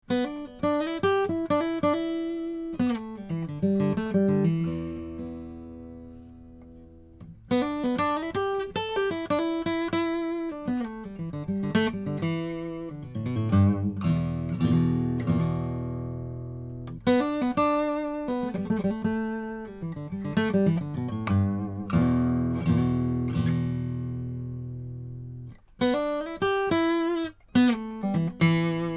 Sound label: Boogie; AMI UM25 Proto